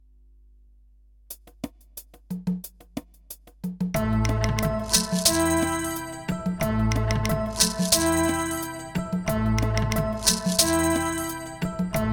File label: backing track